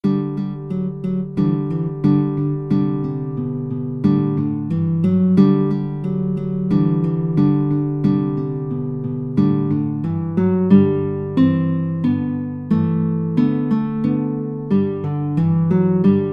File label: guitar, guitar duet